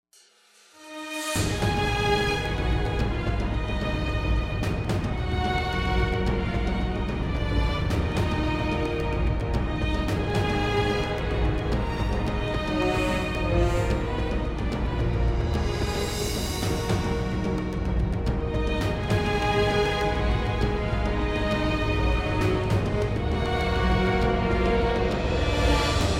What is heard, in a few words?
Giganticus Perc; CivCity Rome